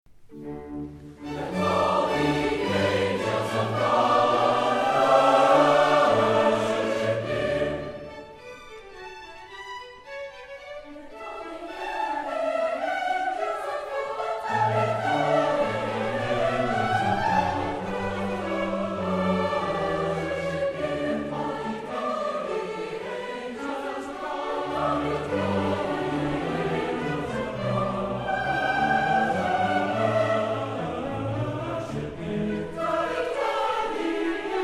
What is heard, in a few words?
concert choir